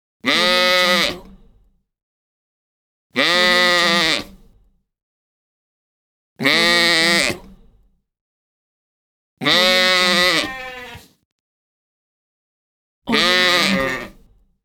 sheep baa sounds pack

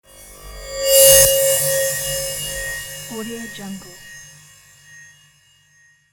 scifi steel swoosh

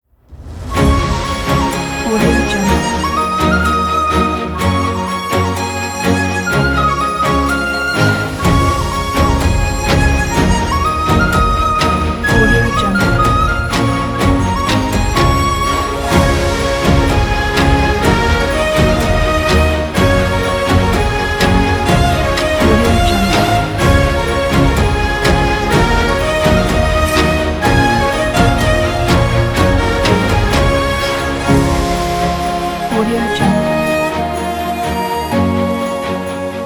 chinese new year

